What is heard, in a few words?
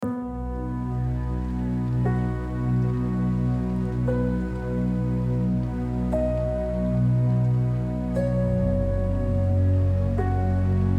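Zvuki Prirody, nature sounds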